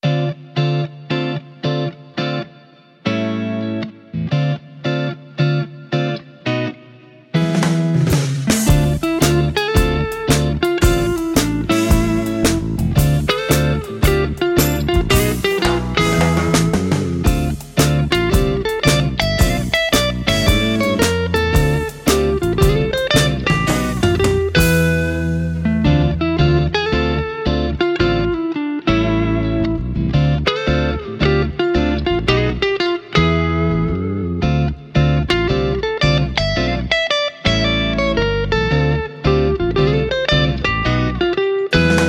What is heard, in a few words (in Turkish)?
(Guitar)